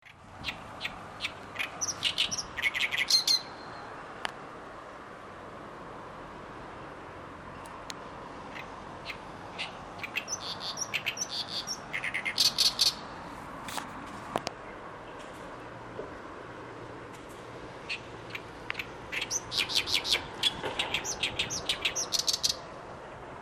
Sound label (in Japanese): オオヨシキリ; ooyoshikirivoice; Daisuke Kaoka